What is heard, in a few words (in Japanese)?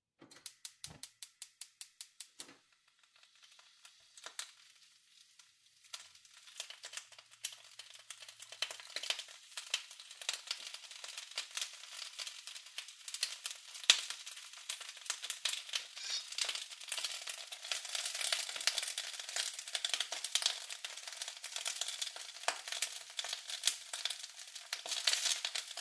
炒める音